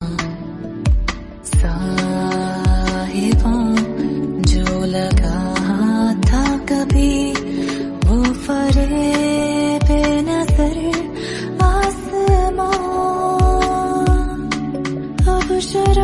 Romantic Hindi Song Ringtone

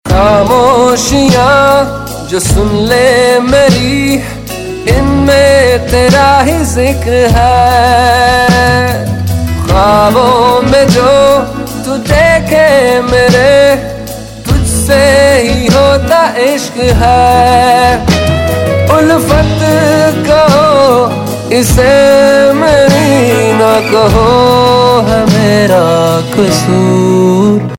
Ringtone

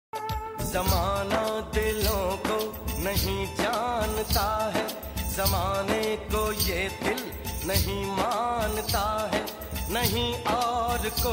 Old Ringtone

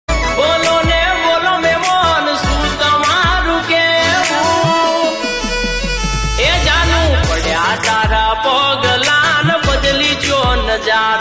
Gujarati Ringtone

